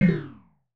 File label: ANSWER WRONG SINGLE